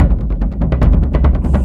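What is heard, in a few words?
Music Shield; from dust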